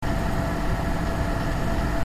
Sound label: fan